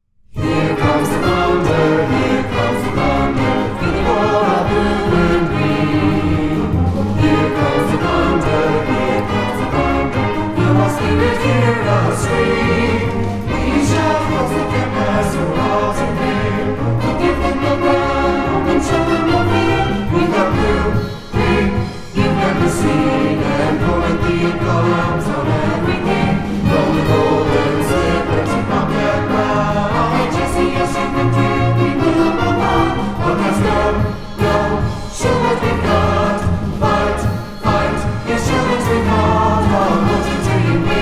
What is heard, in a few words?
here comes thunder voice